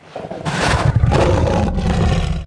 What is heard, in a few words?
Chimaera yes attack